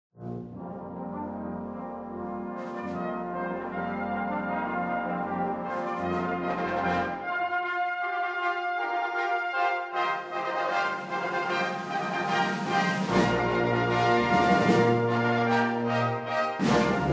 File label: fanfare, town band